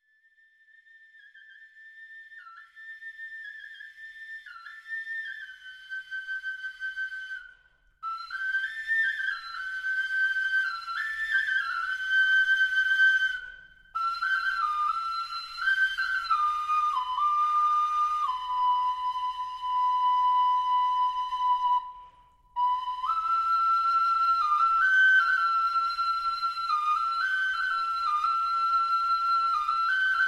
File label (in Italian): flauto osso